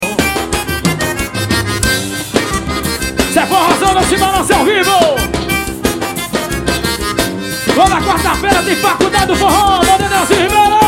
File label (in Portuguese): forro; Manaus